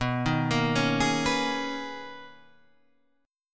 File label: B Major 13th B down